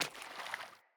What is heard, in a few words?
Sandals Run Water, Footsteps; Player